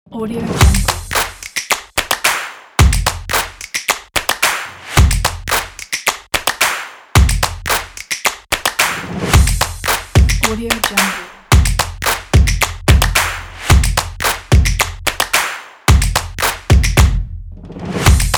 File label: stomp clap snap